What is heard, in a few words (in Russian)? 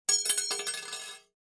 zvuki viazaniia